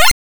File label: Throwing, 8bit; カタナ１